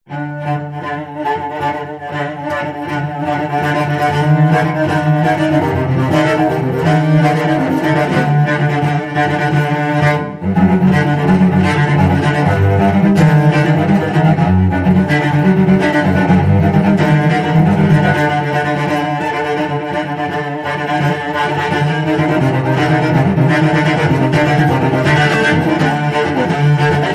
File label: Cello Danger Excitement